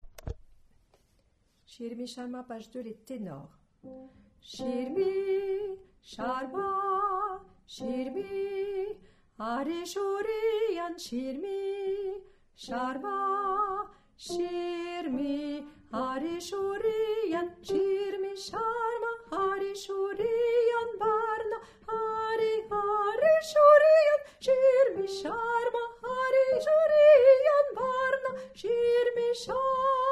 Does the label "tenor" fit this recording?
Yes